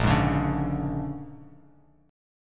A sharp 7th Sharp 9th A sharp down Bass Standard; A#7#9 strummed; JGuitar

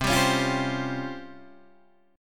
Db Major 7th Flat 5th C

